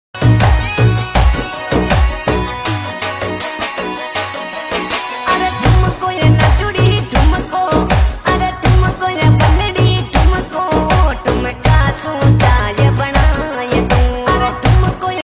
ringtone

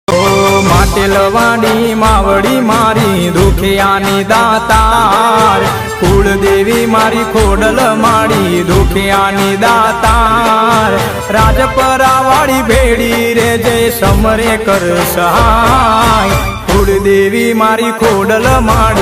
Ringtone Gujarati; Khodiyar Maa

